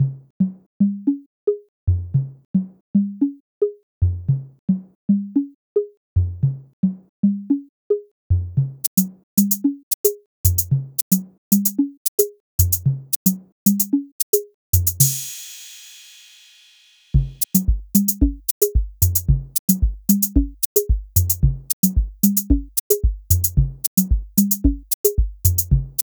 DSK mini DRUMZ

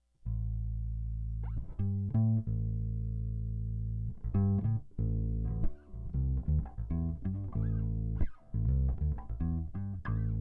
Mellow Bass Guitar

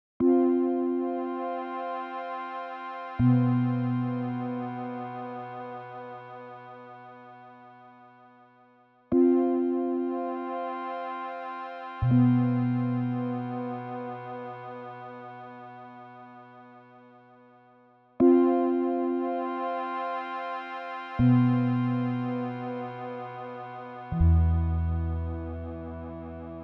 Em80 synth

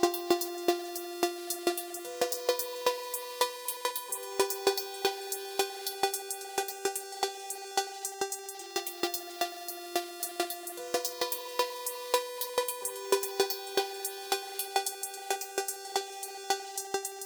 synth

